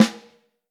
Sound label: Snare Drum